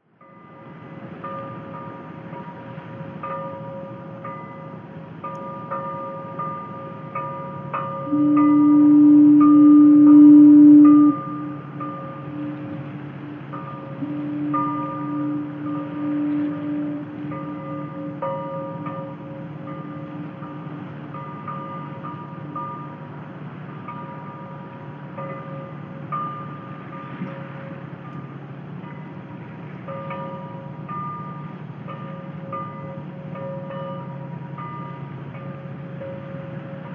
Bell rings, clangs, distant foghorn in background, harbors & marinas buoys